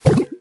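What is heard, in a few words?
rage barbarian step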